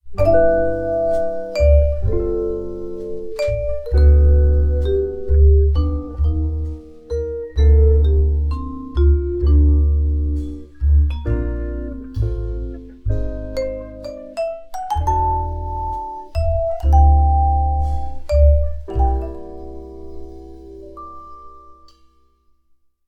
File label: Fall Stroll; Samsung Galaxy S22; ringtones; Calm